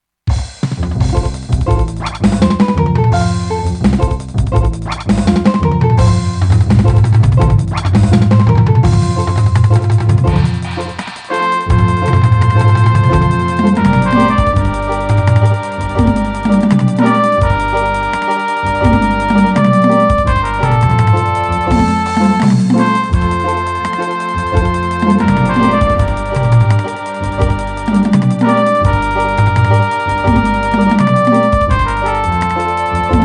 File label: instr